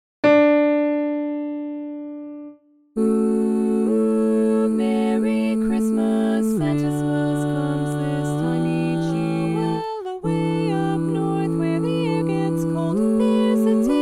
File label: Bass Dominant; holiday music